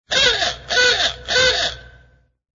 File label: aoogah